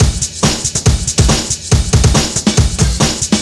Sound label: LoFi Beats, 140 BPM